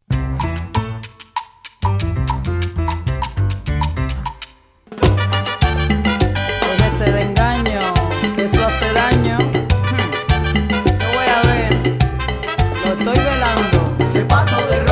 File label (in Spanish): Merengue